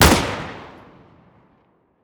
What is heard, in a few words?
elites, weapons; Counter Strike Model Pack; CS2